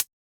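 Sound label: Hi-Hat Natural Closed; Yamaha DJX-2B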